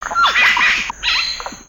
Bird Amazon, ambience avant gardens; Lego

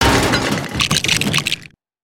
Metallic; destructibles

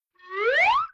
Pop Up, creatures organic; Burno

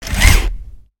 Robot Move Arm Up; creatures mechanical; Lego

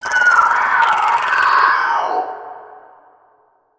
Spider Boss Baby Scream; creatures mechanical

